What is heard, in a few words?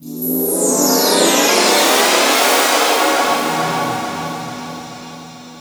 CHORD, 160 BPM, Drum & Bass